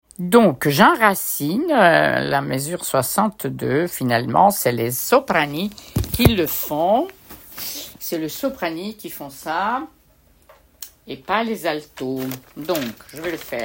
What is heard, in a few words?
soprano; Cantique Jean Racine 3 voix